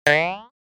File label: Cartoon boing